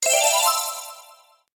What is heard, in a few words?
money; clash of clans